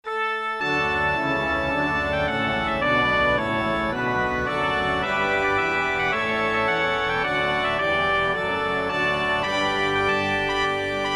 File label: Voluntary, Organ and Brass; Stanley